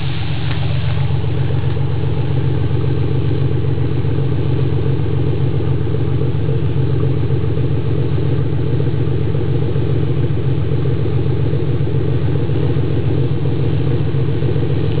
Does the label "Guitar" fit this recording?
No